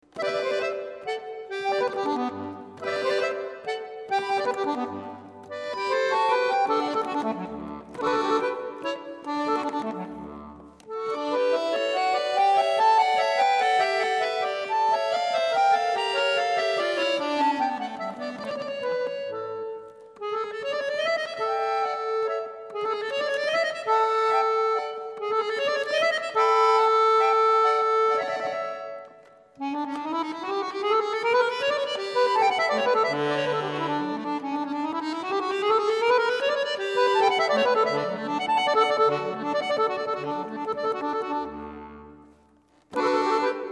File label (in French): sonate sol maj, accordeon; domenico scarlatti; k 146